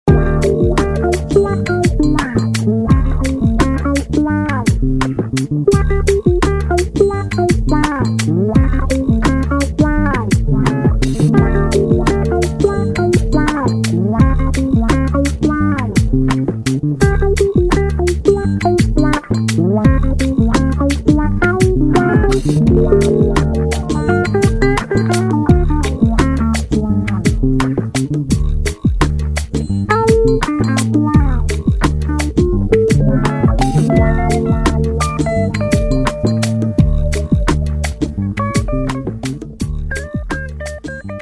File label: wah wah pedal